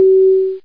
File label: barks and dogs sound